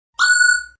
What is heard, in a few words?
Zinger; Zingers; Multimedia